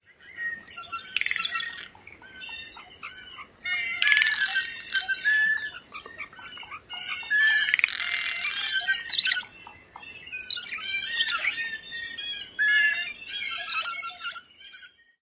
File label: various calls of group with rattles